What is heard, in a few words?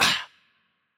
hurt light; VO; bloom minigame